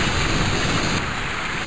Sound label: gas pipe